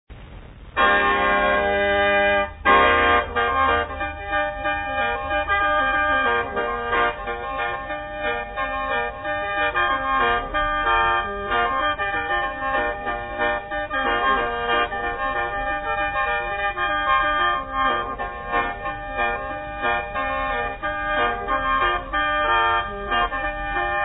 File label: Khaen song, Lao Khaen Music